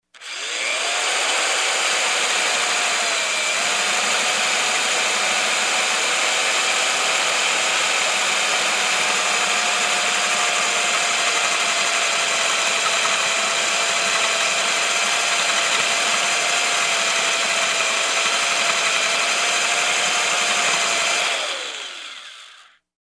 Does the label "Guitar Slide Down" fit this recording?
No